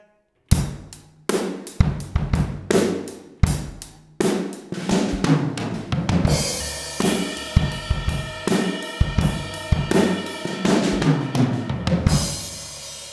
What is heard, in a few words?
5 Piece 5 Mics, drum set